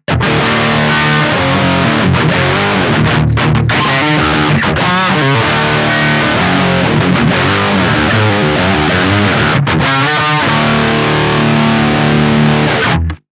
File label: lead, sparkle